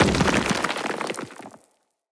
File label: Tesla Cannon Impact Surface; Weapon Impacts; Player; Tempest Cannon